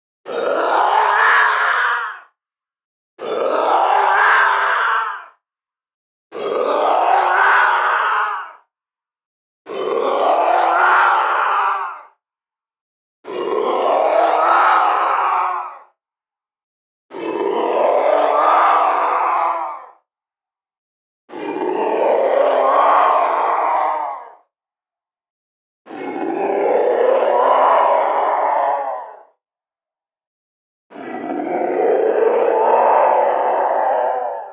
Raging Madman Zombie Beast Bass Cut

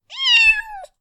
Meow

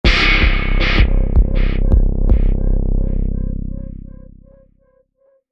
Suspense

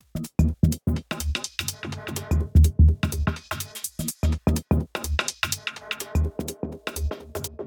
Minimal Shuffle A 125

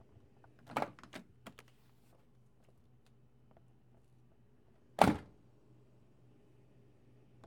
Opening Car Door In Garage Then Closing

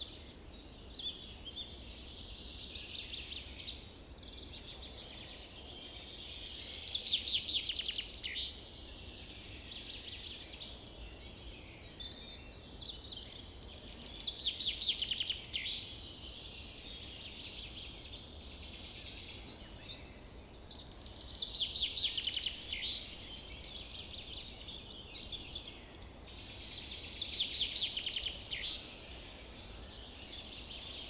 Forest Summer

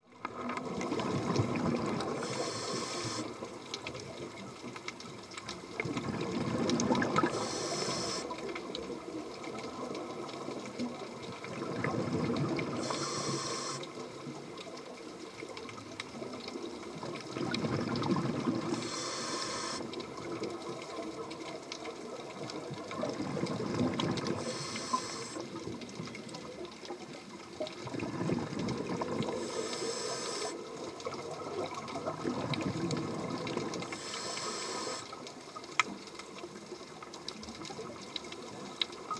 Scubadiving

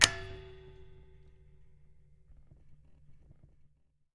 Colide

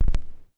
Vinyl

